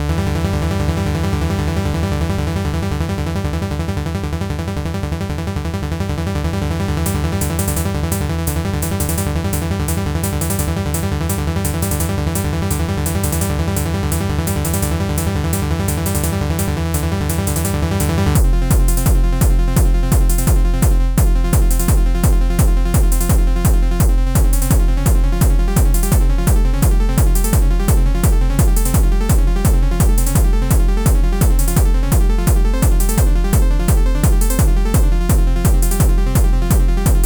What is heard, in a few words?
funkot